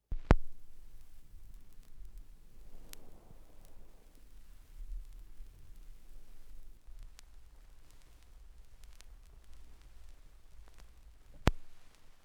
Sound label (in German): schallplattengeraeusche